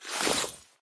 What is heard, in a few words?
bag open; TFC Quick Pockets